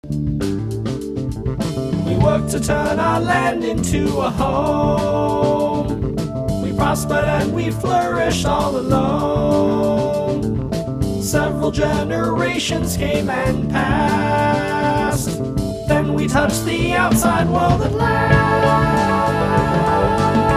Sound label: vox